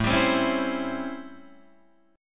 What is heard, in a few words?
A 7th Sharp 9th, Guitar, Open D